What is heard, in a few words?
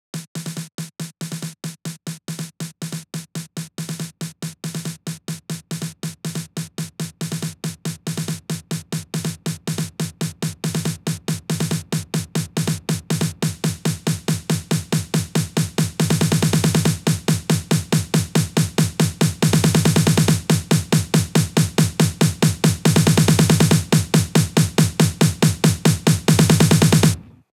140BPM SNARE 16Bars; Vengeance Samples; Snares&FX Pairs